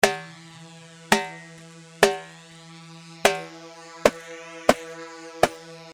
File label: Sagol; Sagol 3 صاغول